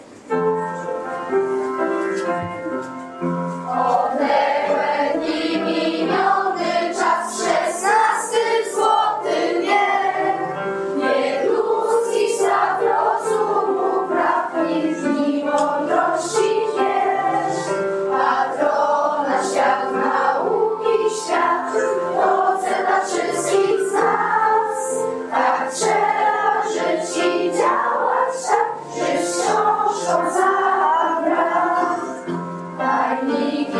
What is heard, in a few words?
hymn